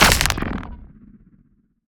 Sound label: Ion Fang Hit Ground